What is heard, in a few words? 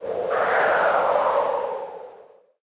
Wind